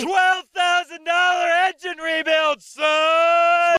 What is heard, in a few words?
engine rebuild